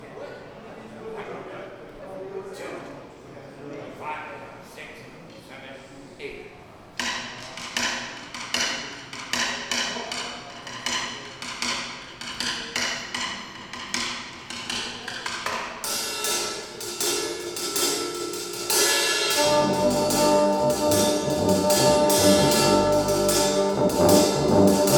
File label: Drum Intro